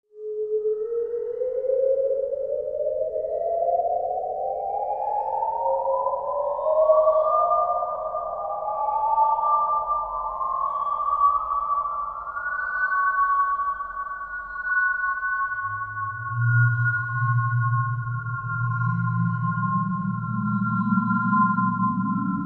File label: Bed Ascend Ghostly Ho